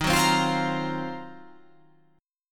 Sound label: D sharp Minor 6th Add 9th D sharp, chord